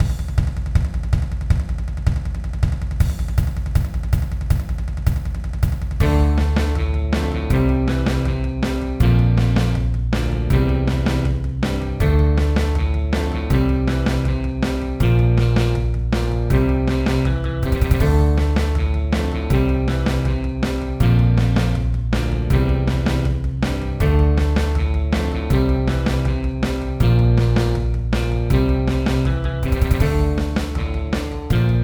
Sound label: Surf Rock